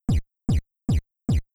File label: Triangle Kick, chiptune